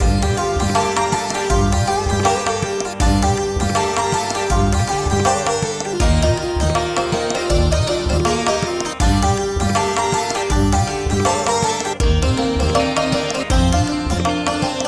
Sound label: Indian Bollywood Dance with Sitar 148